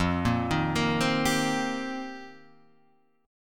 F 7th Suspended 2nd Suspended 4th, Guitar Half Step Down; F7sus2sus4